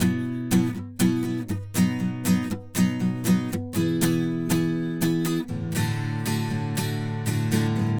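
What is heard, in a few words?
Classic Rock Acoustic, Guitars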